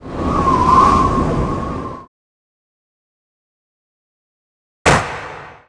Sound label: emoticon